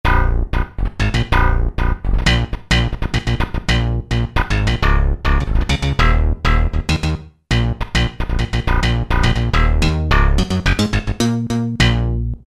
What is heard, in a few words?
Synth Bass; Roland PN-D50-02